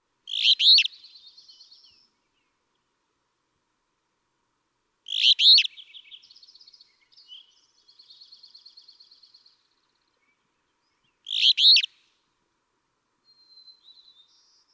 Alder Flycatcher

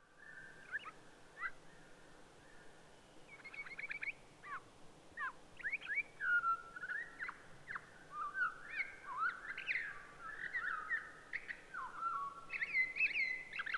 Townsend's Solitaire

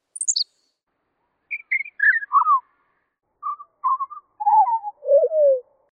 Henslow's Sparrow

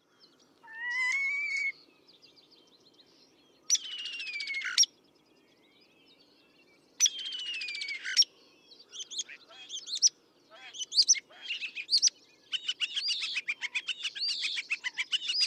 European Starling

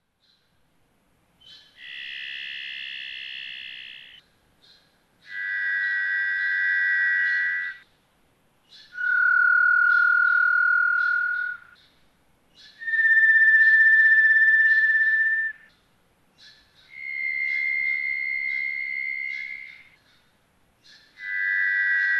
Varied Thrush

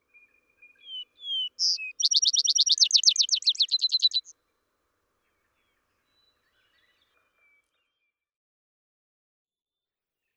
Vesper Sparrow

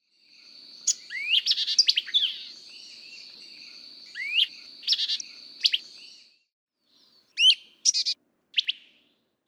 White-eyed Vireo

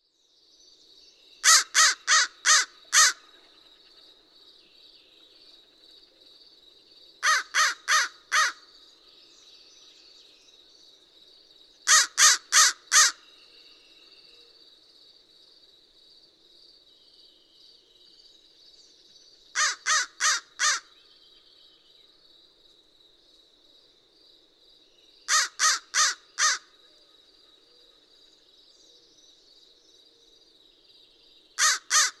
American Crow

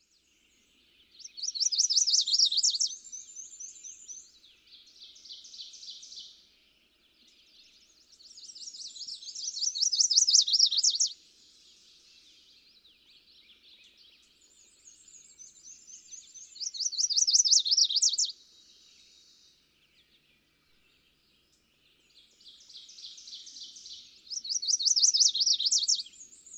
Chestnut-sided Warbler

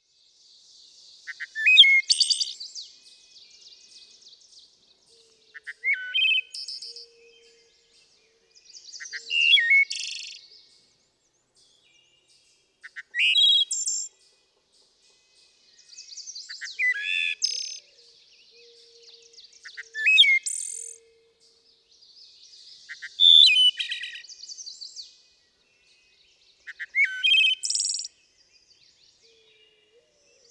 Wood Thrush

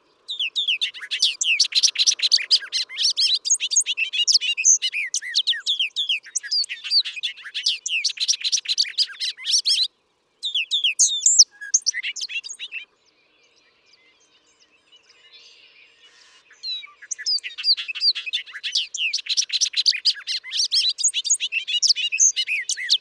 Bobolink

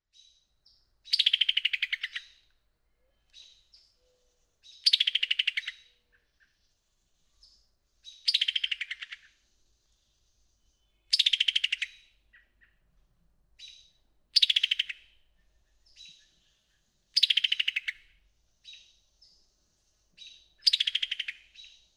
Summer Tanager

